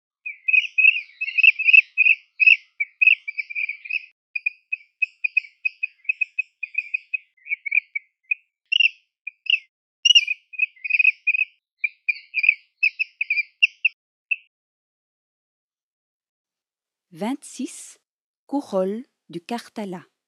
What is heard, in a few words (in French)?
Guêpier de Madagascar, oiseaux de mayotte; oiseaux terrestres; faune de mayotte